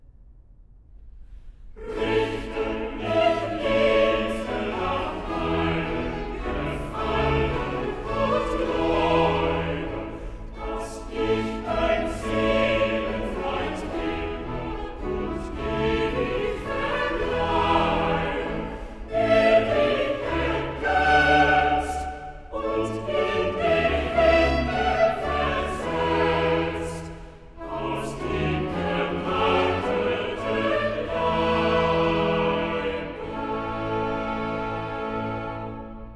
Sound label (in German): Choral; Cantata, BWV 57 'Selig ist der Mann'; VIII. Choral 'Richte dich, Liebste, nach meinem Gefallen'; Bach; New York; 3rd Day of Xmas